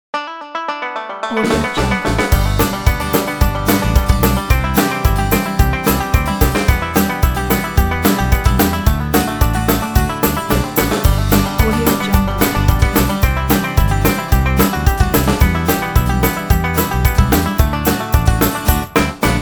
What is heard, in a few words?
bluegrass fun country pop